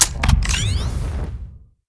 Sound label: laser draw, weapons